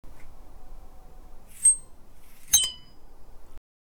soft summer breeze clink

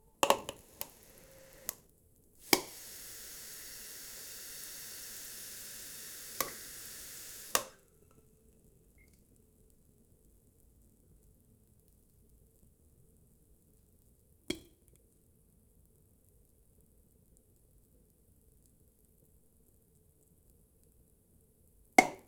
someone cooking nearby

